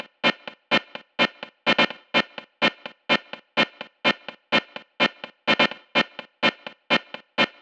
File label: 126BPM Chords Root D; Vengeance Samples; Inception Kit